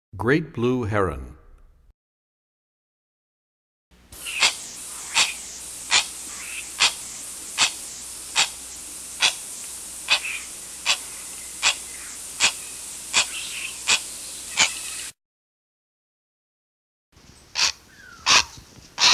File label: Great Blue Heron, Bird Songs; 101BirdSongs